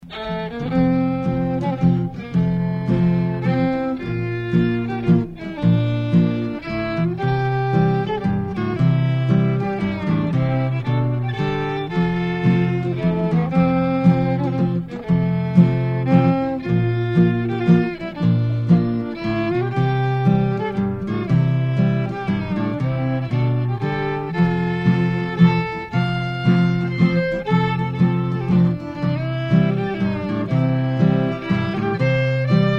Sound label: Waltz